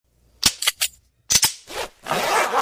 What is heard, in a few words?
Modified clicking